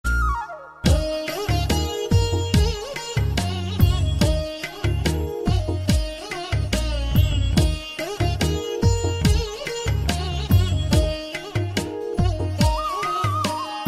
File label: devotional flute; krishna